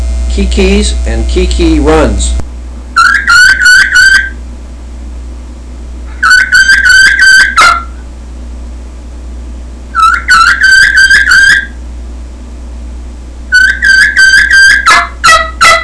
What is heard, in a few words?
kee kee